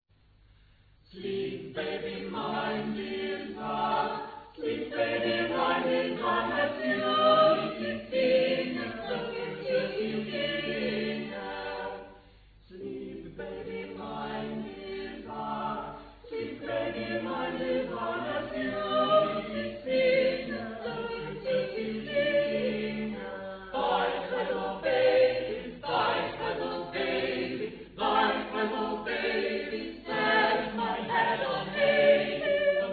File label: baby